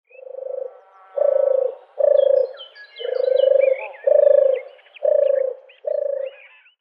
Turteltaube Streptopelia turtur; Vogelstimmen

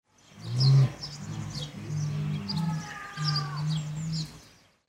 Mitu Mitu mitu

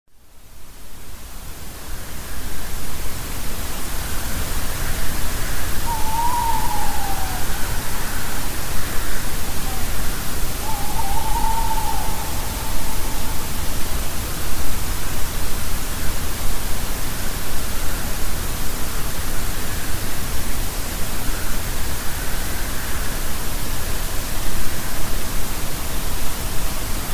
Waldkauz Gesangsvariante; Wahrscheinlich ein Weibchen, in unmittelbarer Nähe eines Uhu-Brutplatzes mit zwei Jun; Vogelstimmen; Christian Fackelmann

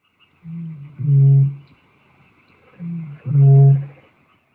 Rohrdommel Botaurus stellaris

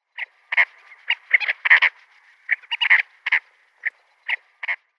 Braunbauch-Flughuhn Pterocles exustus; Vogelstimmen

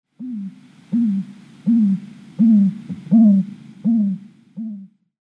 Bartkauz Strix nebulosa; Vogelstimmen

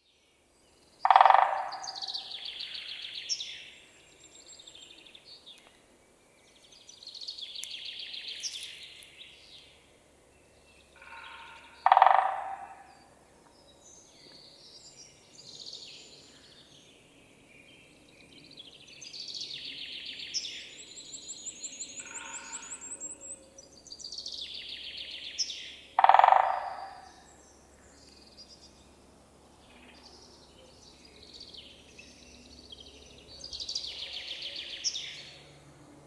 Spechte Picidae; Vogelstimmen

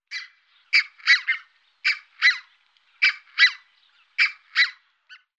Rothalsgans Branta ruficollis

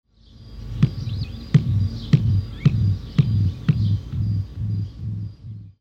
Steppenkragentrappe Chlamydotis macqueenii; Vogelstimmen

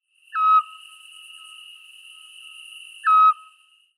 Zwergohreule Otus scops; Vogelstimmen

